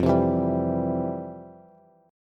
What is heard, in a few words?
F Major 7th Suspended 2nd, F, down, Bass; strummed; JGuitar